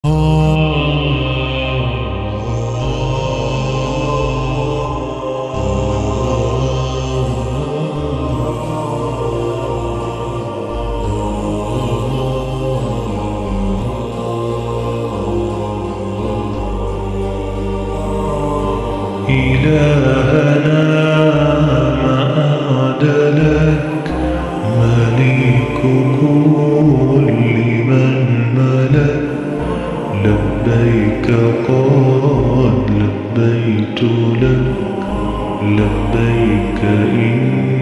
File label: nasheed slowed reverb